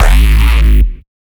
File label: Reese; neuro; BIG5AUDIO